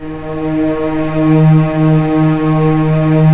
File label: Orch; Roland LCDP13; STR Orch. p Slow